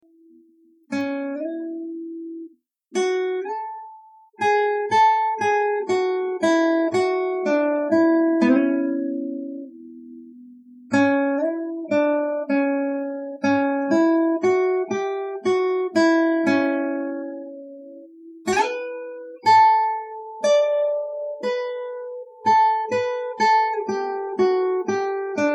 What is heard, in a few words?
sunny autumn guitar